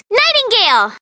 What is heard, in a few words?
Nightingale